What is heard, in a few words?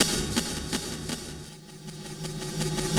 Echo Percussion, drum & bass; Sonic Foundry (Sony Creative Software); Futurist Drum & Bass